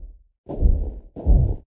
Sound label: Sandscrit Walk Sniff to Eat